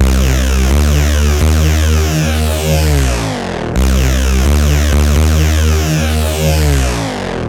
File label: bass 128 Cm; Electro; bass loops; Anthemic